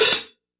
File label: hat open; Sonic Foundry (Sony Creative Software); Ugly Remnants VOL-2; Beatstuff